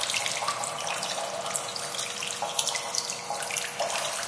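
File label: ambient water drip loop; video games; nature; source engine; portal2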